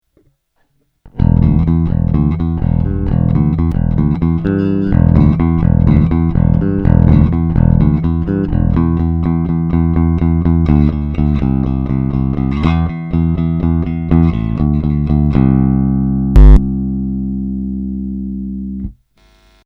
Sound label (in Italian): Plettro; basso a quattro corde, ispirato ai canoni classici del Jazz Bass